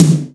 TOMTOM, DANCE

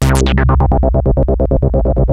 MG1 reso loop, Moog; Rubber Chicken Software Sample Bank

